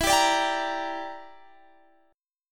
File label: E Minor 7th Flat 5th E, Guitar Standard; Em7b5 strummed